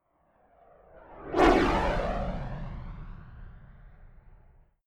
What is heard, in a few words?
rocket maverick flyby whoosh fast left to right close